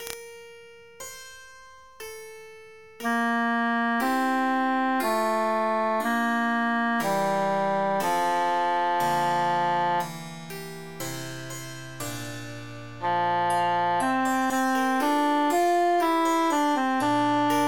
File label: tenor emphasized